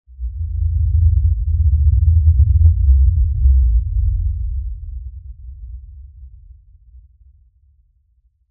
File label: Impact Lfe Rumble; Impact Lfe Rumble Pass By Bass Low; Low Impacts; Sound Effects:Impacts; Blastwave